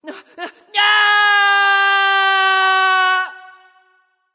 fear; Smite; Hou Yi

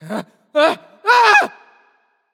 fear; smite; terra

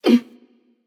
grunt jump passion; chaac

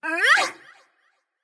grunt jump light; smite; voicepack; hel

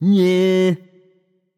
tongue; voicepack; smite; merlin

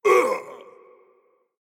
hitreact human male large

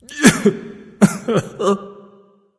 boohoo; cuchulainn

